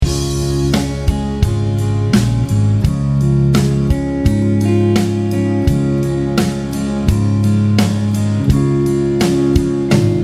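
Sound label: triad loop 4/4 major D flat; triadloop 44 maj db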